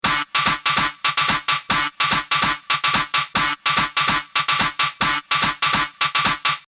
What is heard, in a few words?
speed percu; Loop Mania Sampler Pack